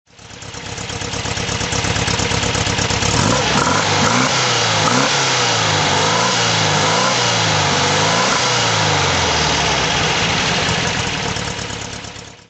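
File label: porsche 356sc throttle, engine sound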